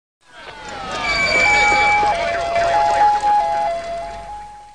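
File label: AMBULANCE; Sound Library 1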